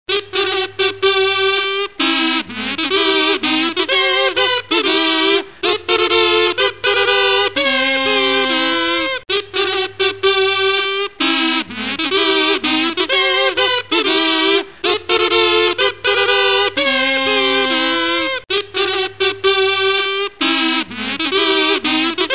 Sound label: Mad Marsh, Funny instrument; Ringtones